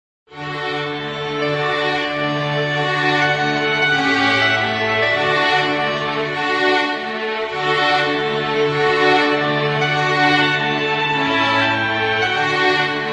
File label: clarinet concerto, alert tones; Pantech; P2030 Breeze 3